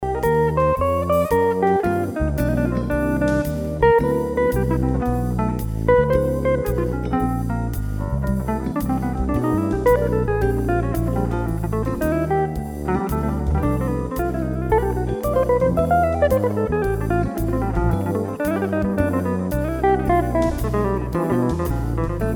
guitar and backing track

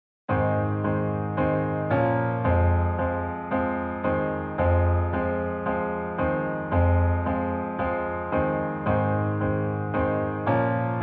Muddy Vocal

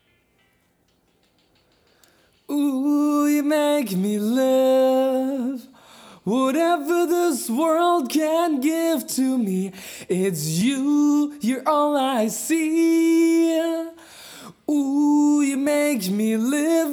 Vocals Only

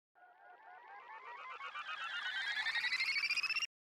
bubble down reversed

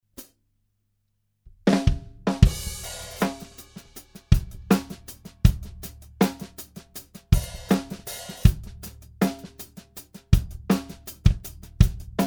Drum Test; 2 Daws

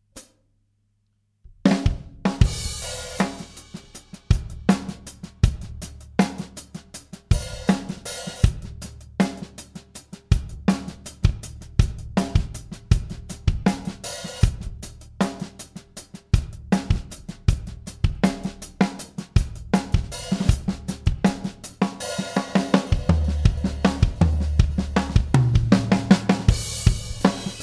drum test, verb and buss comp

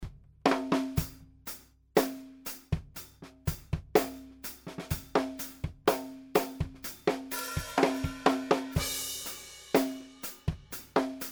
Snare and mono overhead

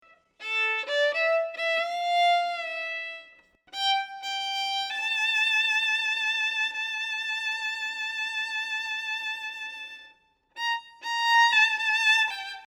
Violin Sample

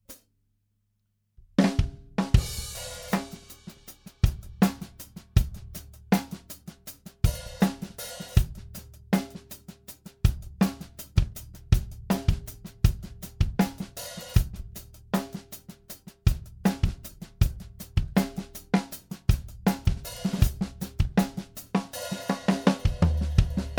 Drum Test

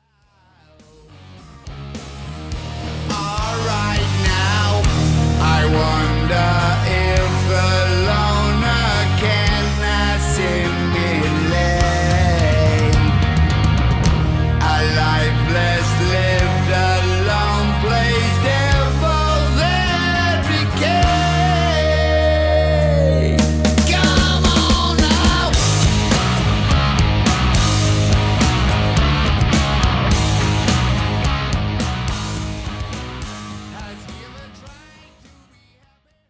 Toms Hard Panning Example

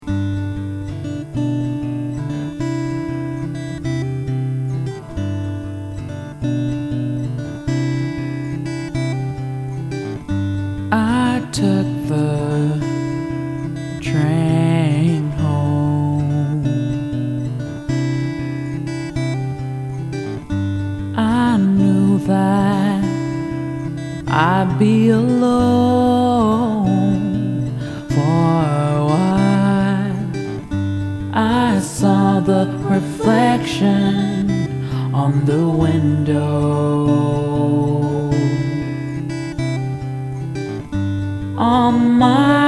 Folk Song

